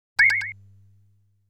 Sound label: Enemy Fires a Bullet